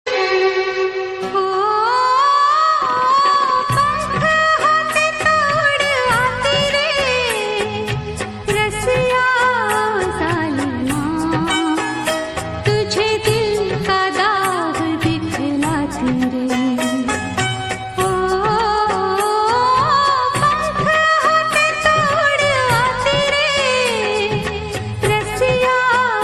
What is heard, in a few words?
flute bansuri ringtone